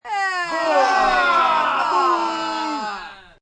Group Boo Jeers, Booing, group. 5-10 Males & females, short, sports; human voice